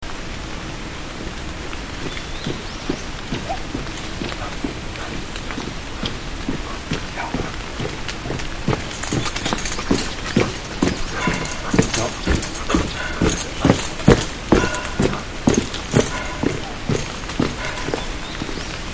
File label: dog